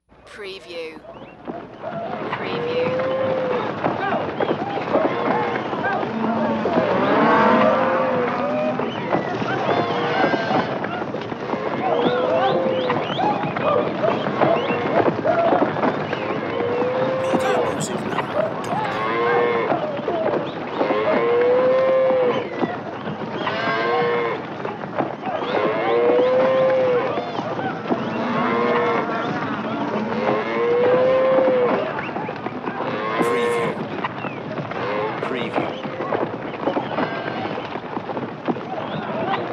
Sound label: Cattle Drive Whistle Yellin